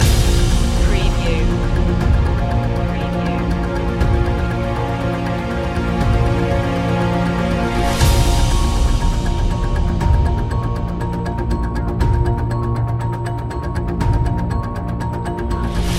villain loop

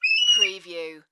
American Bald Eagle squawk

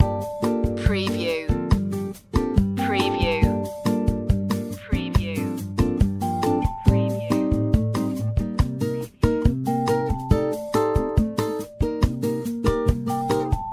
yoga bossa loop

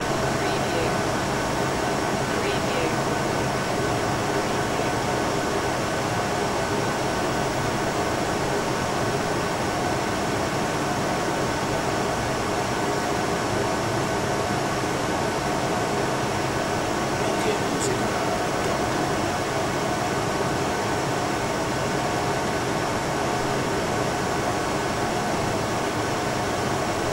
air condition

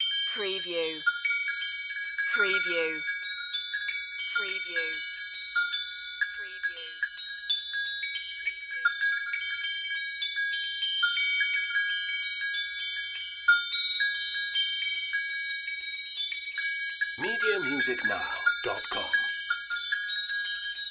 shaking chimes

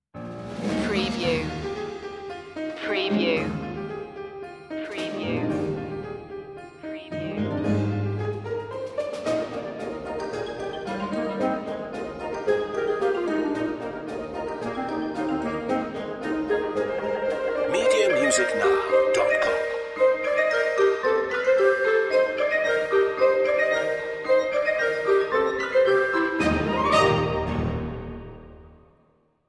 toys marching

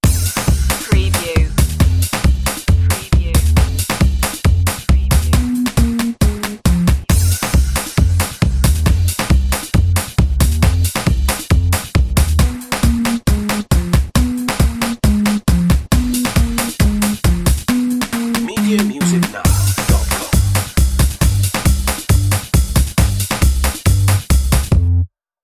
swing da house loop

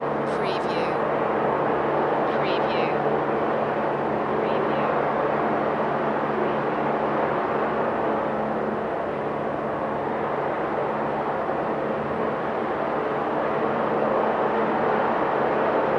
helicopter noise

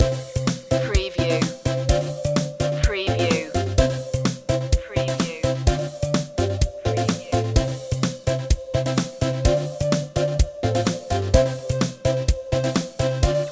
playtime loop

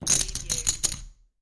Poker Chips Stack Of Chips Dropping On Felt

